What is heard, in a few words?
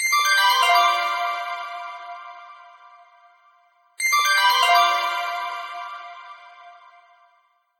Alarm Clock